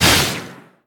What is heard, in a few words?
skill wind arrow shoot; Cookie Run; agar agar